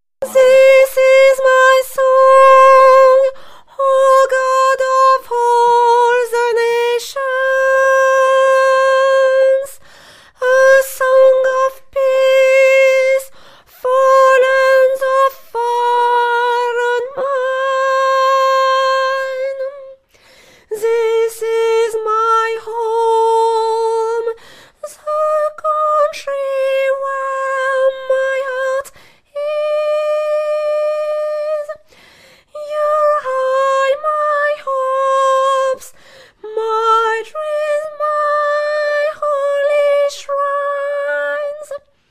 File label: Finlandia Tenor